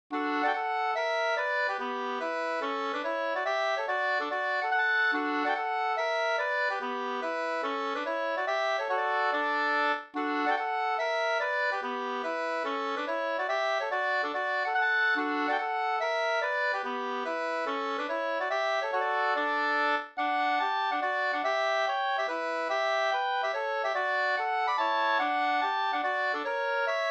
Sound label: mazurka; Anders-mazurka av Erik Dannberg fi 2st